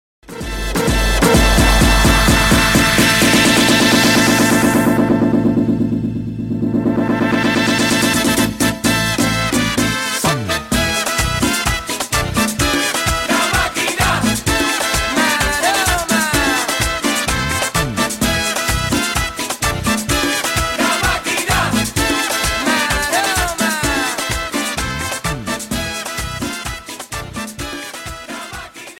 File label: latin ringtone